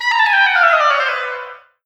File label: Descent, iPod sounds; i OS